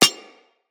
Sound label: Bullet Impact Generic Metal; Weapons; Surface